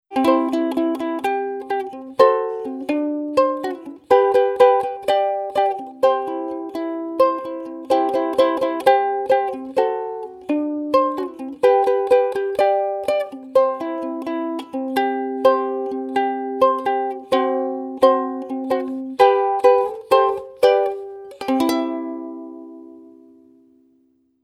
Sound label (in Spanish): ukelele